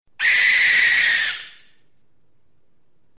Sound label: barn owl scream